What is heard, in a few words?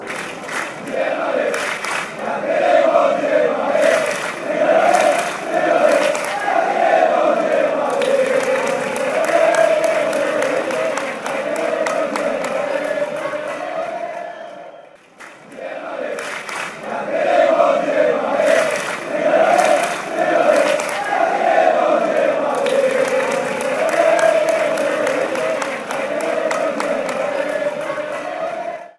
we will sing, fan chants